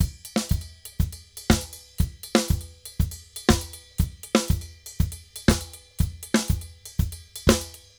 Drums Samba 120 bpm; Best Service; Festa Latina VOL-1

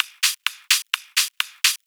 Hihat Loop 128 BPM

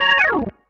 ORGN 123, Keys; Houseworx

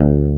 BASS G1; AKAI S-Series CD-ROM Sound Library VOL-8

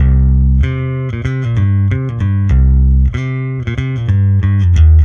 EBass Brdg Pick 95 C2; musicradar; bass boutique; 95bpm

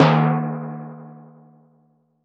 Timpani, Percussion

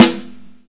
Snare Warm, Drum and Bass; EZ Rollers; Instrument Samples

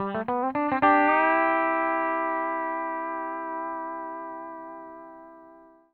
105 R, PD LOOPS

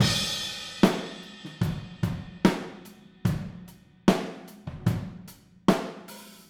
Verse Overhead ST, Drums from the Big Room; Sonic Foundry (Sony Creative Software); Drums from the Big Room The Mixes; Demo Projects

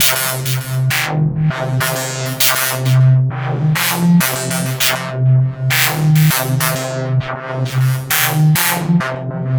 Sequence, Drums & Drones

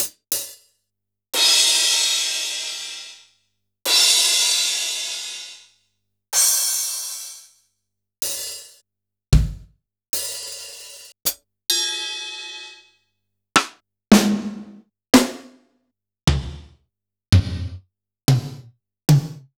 Kurzweil Kit; Sliced

